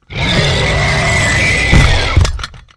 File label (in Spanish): caida